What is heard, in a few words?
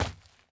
dirt land